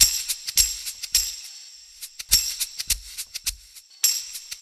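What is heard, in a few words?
percussion, Roots Funk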